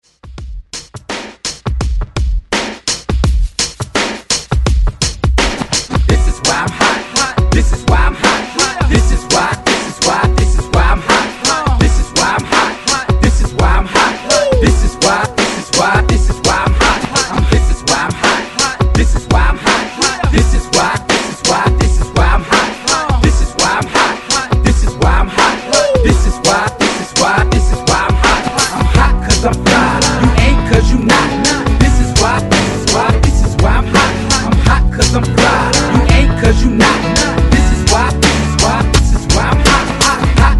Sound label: 90s Hype ReDrum 84 Bpm; DJDOLLS